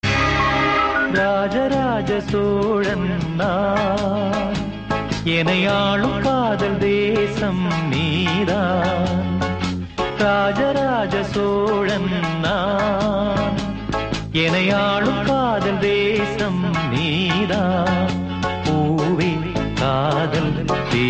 flute instrumental; rettai vaal kuruvi; tamil

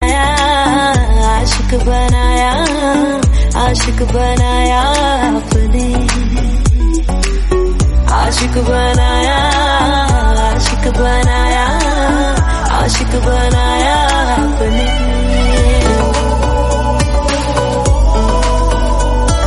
female version hindi

